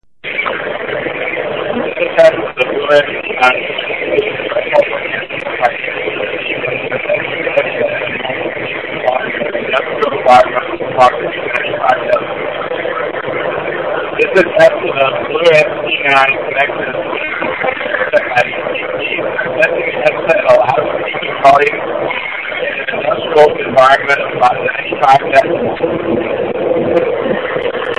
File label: Indust 95db; BlueAntZ9 normal; Mogul